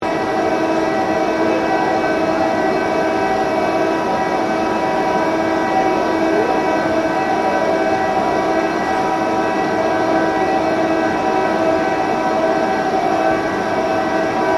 PULLEY SYSTEM MINE CABLE OPERATING LOOP, Colonial era machinery; Machines